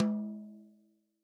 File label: REAL DRUMS POP KIT